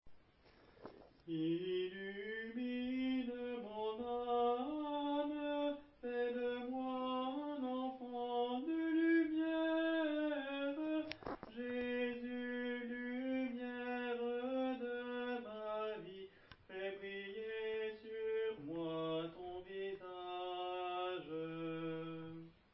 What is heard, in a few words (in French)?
Couplet; qui regarde vers lui